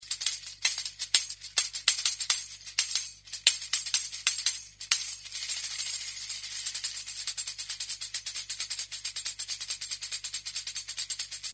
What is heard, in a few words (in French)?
Sample Tambourin